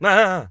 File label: surprise